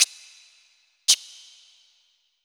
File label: FUNK, VX; USB Soundscan vol.47; AKAI